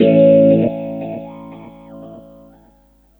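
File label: GTR, guitar; SLIDKIT; USB Soundscan vol.31; Miro s Grooves; AKAI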